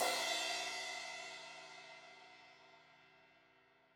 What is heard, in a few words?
Crash 16in edge, Cymbals; Deeply Sampled Drums